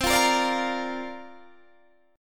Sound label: C 9th Suspended 4th C down Guitar Open D